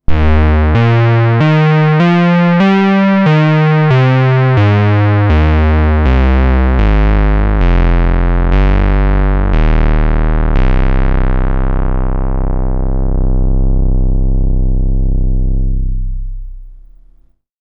sx1000 bass

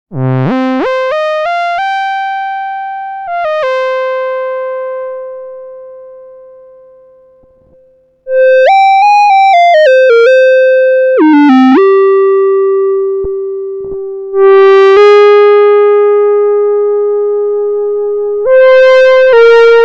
sx1000 lead

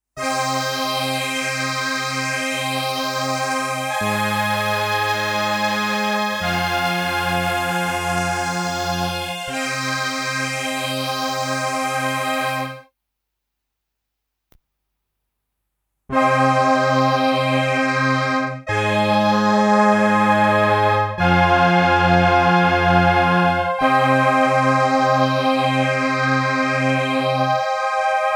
Strings, korg pe2000

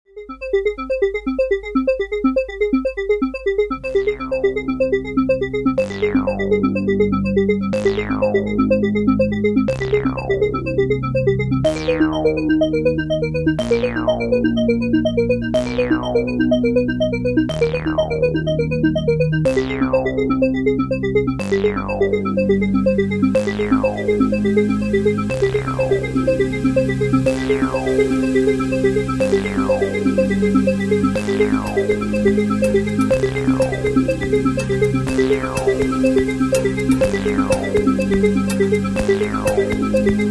monowave double arp

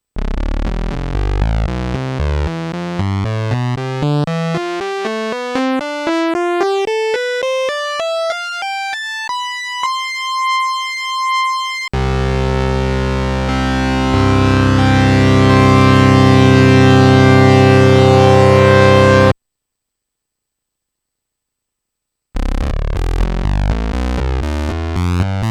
Prophet 10 Vintage; sequential; Qwave

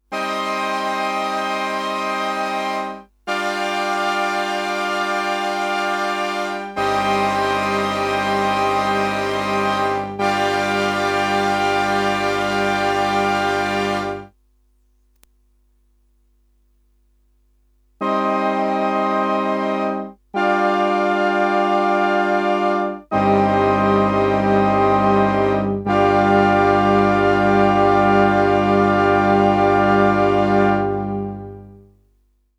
Pipe Organ, korg pe2000

